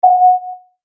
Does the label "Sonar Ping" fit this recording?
Yes